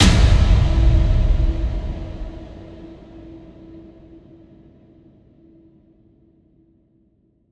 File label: Big Metal Hit; Trailer Pack